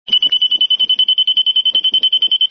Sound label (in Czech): ekolo zvonek